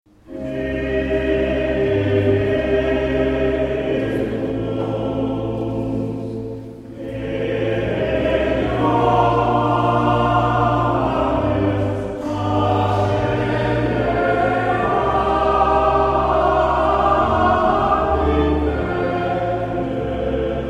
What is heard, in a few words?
Motet